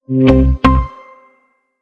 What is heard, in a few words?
android messagetone